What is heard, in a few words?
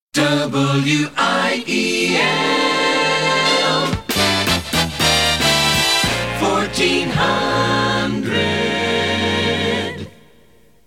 jingle